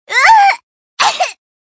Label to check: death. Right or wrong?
Right